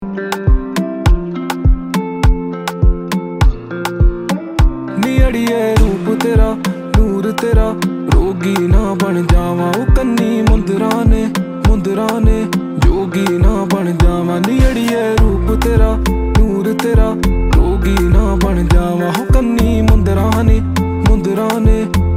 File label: Song Ringtone